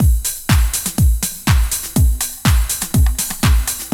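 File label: garage beat; AMG; Now CD-ROM (Roland); 120-125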